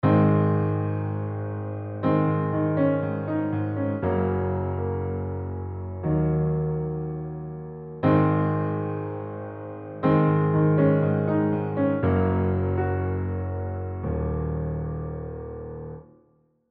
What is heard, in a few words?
EZkeys 2 Session Grand Concert